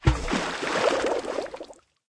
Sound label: Sfx - Well; Farm Mania